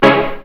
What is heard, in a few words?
SYNTH; SoundFactory